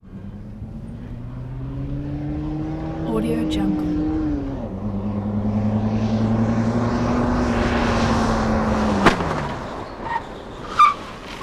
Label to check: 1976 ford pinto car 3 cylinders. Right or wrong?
Right